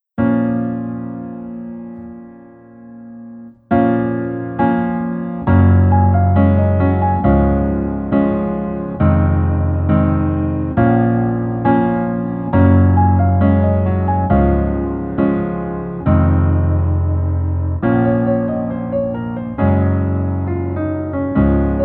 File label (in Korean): Piano ver 멜로디MR